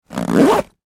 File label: Sports Duffle Bag Zipper Close Short; Zipper Sound Effects; Zippers (Sound Effects:Objects); SFX Bible 6